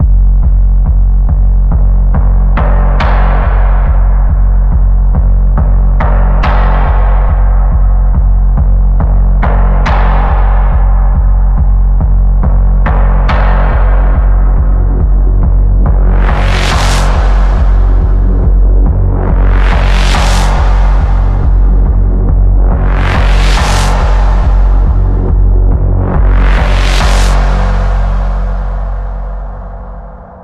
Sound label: Deep Pulse Drive, F sharp